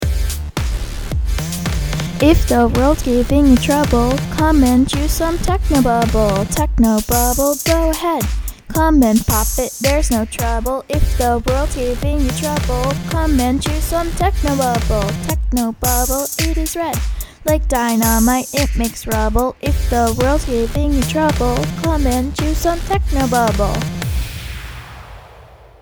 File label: Techno Bubble; Frontier Middle School